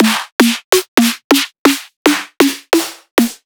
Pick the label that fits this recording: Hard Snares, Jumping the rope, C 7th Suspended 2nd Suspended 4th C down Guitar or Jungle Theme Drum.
Hard Snares